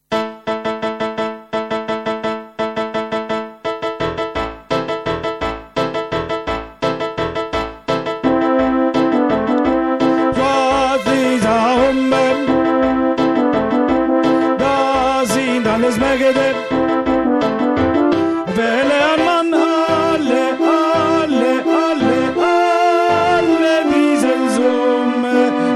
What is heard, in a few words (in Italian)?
soprani 2, coro; Carmina; Swaz hie gat umbe